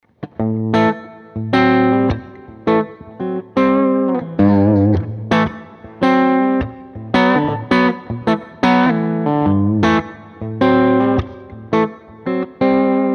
Digitale Gitarrensounds British Blue Tube 30 TB; Amplitube 5

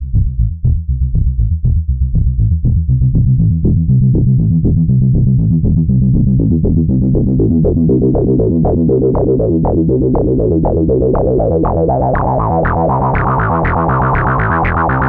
Moogerfooger Synth Mf 101s Filter Bassbereich Resonanz Und Fm Amount; Modulation

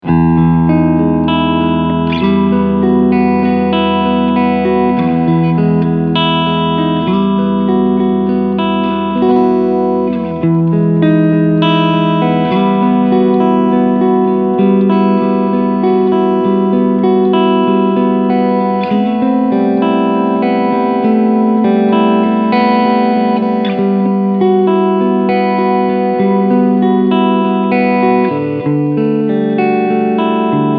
Clean beide PUs SC Mode

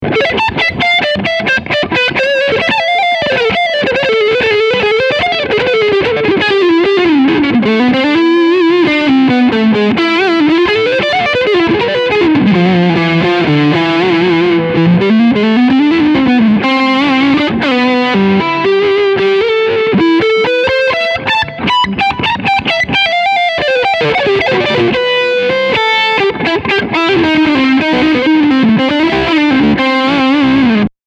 Leadsound Front HB

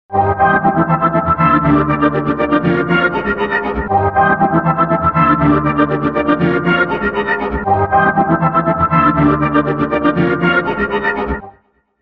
Orchester; Unreal 23rd Century

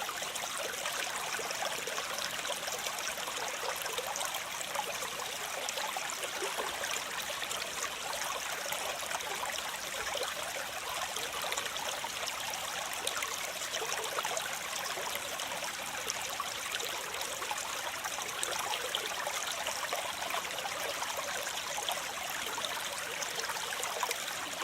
Field Recording Kleiner Wasserfall

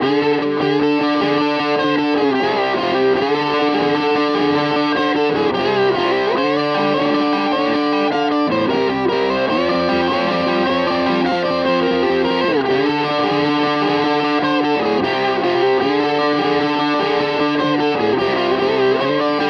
Harley Benton Vergleich HB Zerre; Maybach

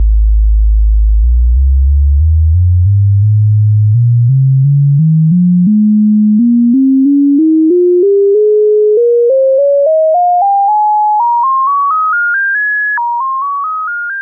Rossum Electro Music Evolution Filter als Sinus Oszillator

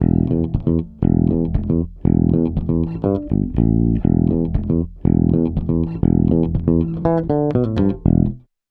E-Bass; Tegeler Audio Vocal Leveler

